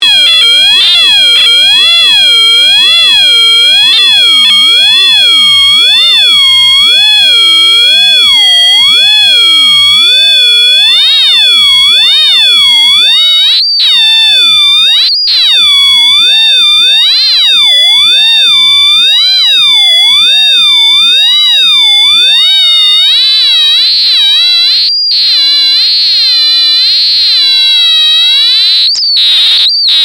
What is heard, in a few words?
OpenTheremin